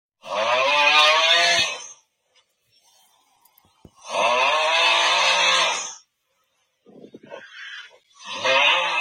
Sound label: auugghh